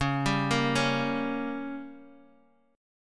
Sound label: A sharp Minor 6th C sharp